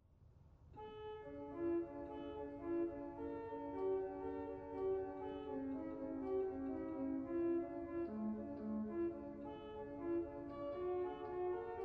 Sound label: Octave 4, Sauer; dortmund